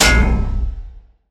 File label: metal impact; soundscrate